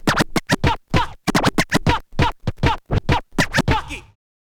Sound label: scratch kit, vinyl; scratches; Masterbits; Vinyl Attack